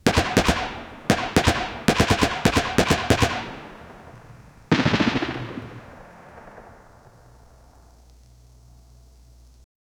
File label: rheinmetall anti aircraft, machine gun